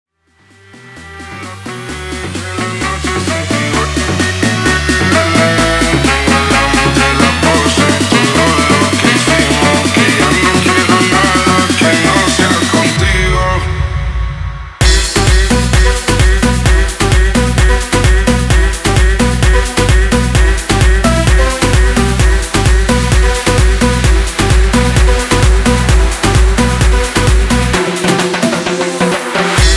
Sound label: remix 130 bpm; bootlegh